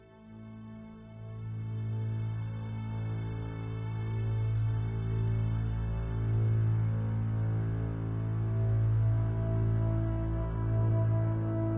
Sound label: Jungle drone; Adera; Android